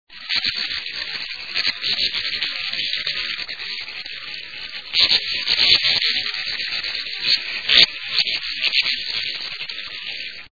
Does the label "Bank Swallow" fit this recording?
Yes